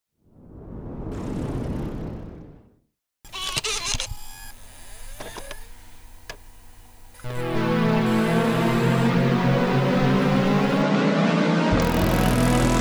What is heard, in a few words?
jingle; scene/logo: simultaneous jingle and animation